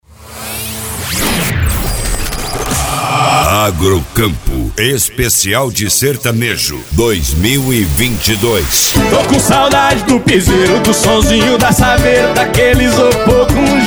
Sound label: SERTANEJO; AGROCAMPO 2022; PARANAIGUARA GOIAS